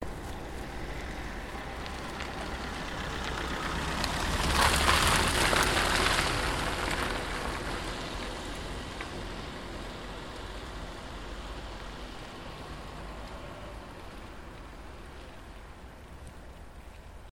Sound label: Diesel Car Approaching And Stopping Briefly Before Continuing